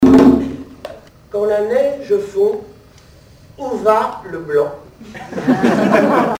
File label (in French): neige